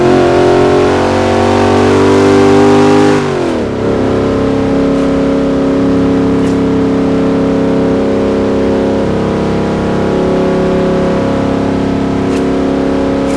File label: fourth cruise, vehicles; gmod; tdmcars; morgan aero